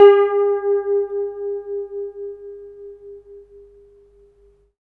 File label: SITUR G4; Spectrasonics; Heart of Asia